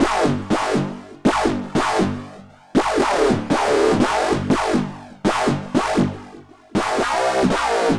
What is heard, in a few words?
Wow Bass; Basses; Dr. Fink's Funk Factory